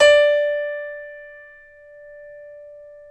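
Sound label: piano, Grand piano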